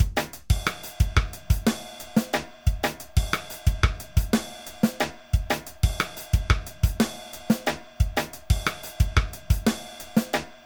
drum; bombogroove

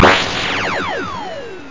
cock; Graliens

